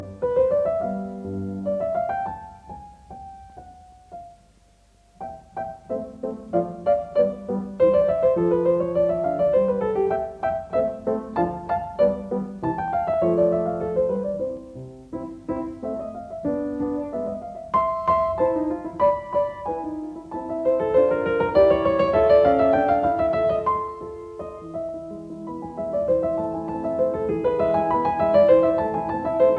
Piano Sonata No 16 in C major K 545 Sonata facile III Rondo; Lili Kraus